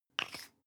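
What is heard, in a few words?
toothpaste close twist hard, Bathroom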